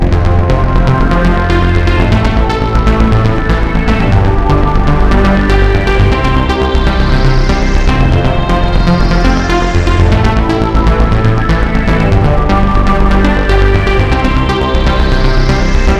Arp, Keyboards

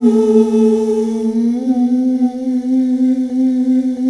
mixed ooh; E-MU Formula 4000 Series Vol. 1 - Hip Hop Nation; Vox FX

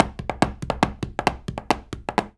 133 STEPS, Spanish And Gypsy

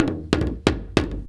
BIG TAMA HIT; Best Service; World Colours

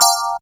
Short Bellz; Zero-G Jungle Warfare 1, 2 & 3

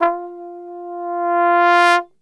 F 1, Brass; Best Service; Brass Super Section

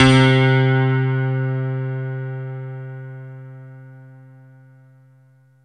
JV E GRAND, JV-1080, PIANO

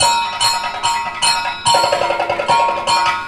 KATAK, Indian Traditions

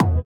SITAR; Spectrasonics; Metamorphosis; INDIATEK

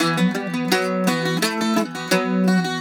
Celtic Flavours; USB Soundscan vol.42; AKAI

